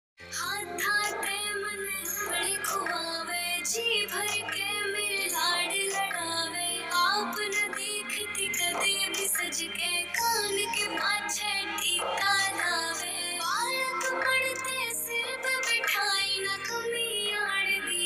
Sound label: haryanvi ringtone